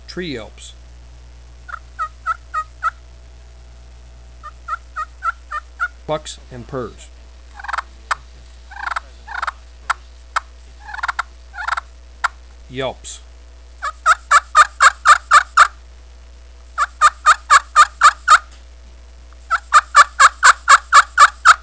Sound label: slate all, turkey